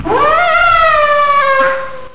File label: momma idle